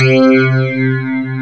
pluck C3, FM pluck

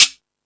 Timbale, E-MU Pro-Cussion

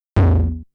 RM1X Drum Anlg Kit Tom Ana Dist; Yamaha RM1X

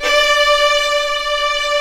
VL SEC D4; AKAI S-Series CD-ROM Sound Library VOL-7; CELLO+VIOLIN

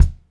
86 STR BD, Funk-Groove Drumloops; USB Soundscan vol.38; AKAI

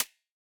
hats C, hip-hop drum kit

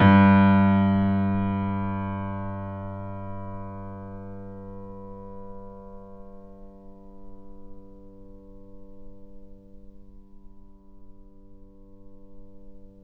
Mp G 0 off, Grand Piano off mic; AKAI S6000 CD-ROM - Volume 2

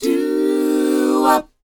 DOWOP F, Vocal R&B DOO WOP; ILIO; Vocal Planet

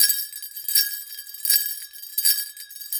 Sleigh Bells; Sonic Foundry (Sony Creative Software); Continental Drift Aftershocks; Shakers

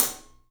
Studio Hats, 3DPercussives; E-MU Producer Series Vol. 5 – 3-D Audio Collection

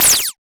Lasergun, Roland BK-5

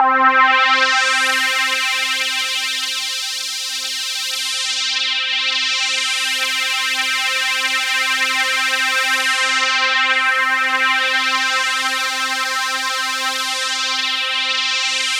C3, SynthLine; 90 s Sample CDs; NiceBeats; SynthLine VOL-1

